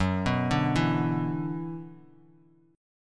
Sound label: Gb 7th Suspended 4th Sharp 5th Gb Bass Standard, Gb7sus4#5 Chord; arpeggiated; JGuitar